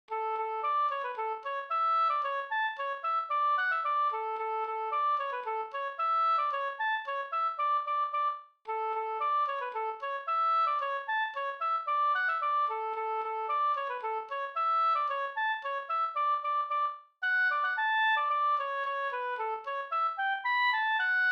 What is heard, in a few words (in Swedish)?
polka, D dur